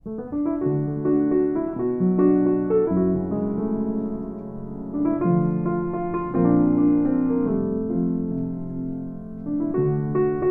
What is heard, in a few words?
Piano